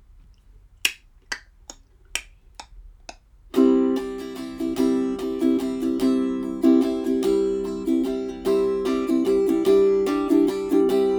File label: sea waltz